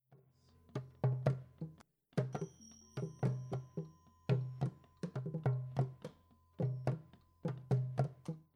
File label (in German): Barbie trommelt